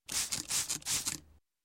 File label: Spray Can Spray Bottle; Sound Ideas 2000 Series